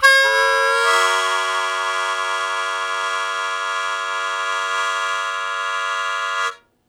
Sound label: Blues Chord Attack F#1, Harmonica; Zero G - Harmonica